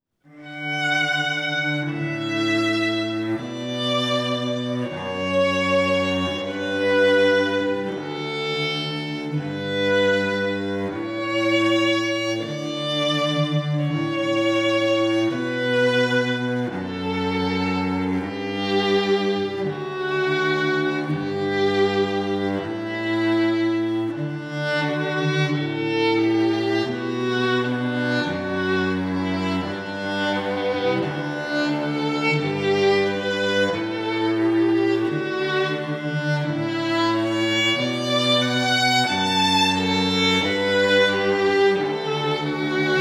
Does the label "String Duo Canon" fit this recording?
Yes